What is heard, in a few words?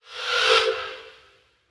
Banshee Missile Homing Loop End; Evolve; Elder Kraken